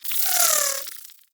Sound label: Inf Uzi Proj By; Infested; Weapons